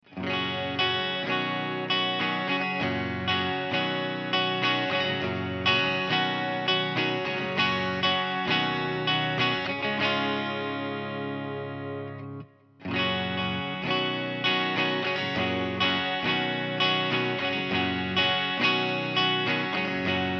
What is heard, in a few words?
front rear